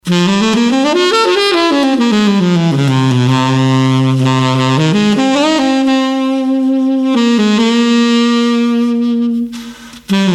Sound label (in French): sax, j'ai ici doublé la voie de sax deux fois et désaccordé un tout petit peu